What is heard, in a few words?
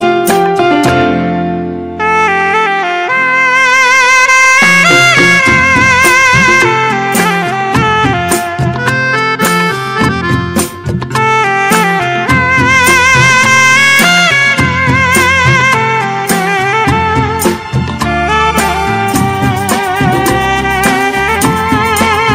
Love tone